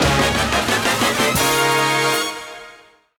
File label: large Win; piggyriches